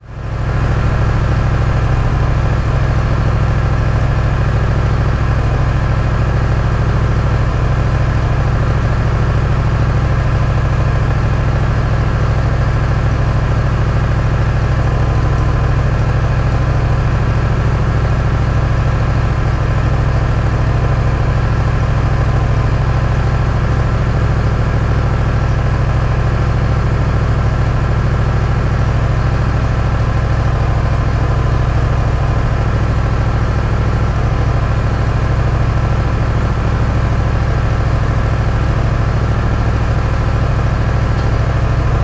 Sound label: industrial generator or desiel machine engine idle